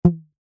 rubber band thick large ping; foley; zapsplat

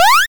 Jump

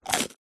catoon bite munch single

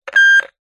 cb radio walkie talkie crackle beep

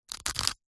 food pepper mill plastic turn grind single; zapsplat

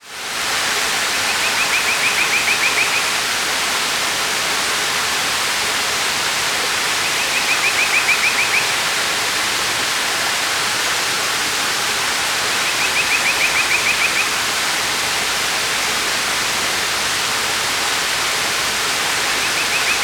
nature forest ambience waterfall close by birds

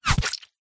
bullet hit body; zapsplat

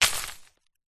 foley stone drop ground light debris

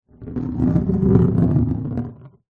clay ornamental ball roll on surface; foley

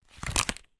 musical recorder slide in pouch case; zapsplat

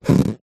rubber squeak; foley; zapsplat

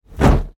Whoosh Fire; Just Whoosh 3; soundbits

